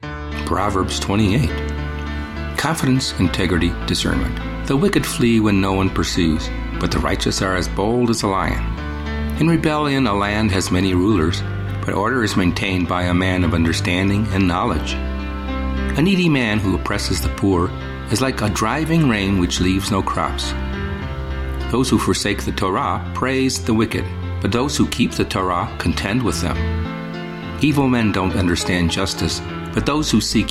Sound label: gentle soundtrack newage oboe; Proverbs